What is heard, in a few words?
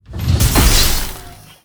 mecha explode